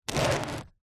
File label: household book large old hardback slide on gritty hard surface; zapsplat